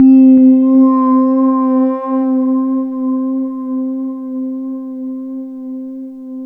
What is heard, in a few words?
ZZZ Pad, FM; Polyend